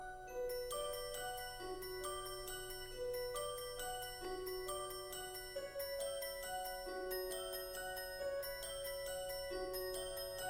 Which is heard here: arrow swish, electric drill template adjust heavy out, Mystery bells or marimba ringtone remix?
Mystery bells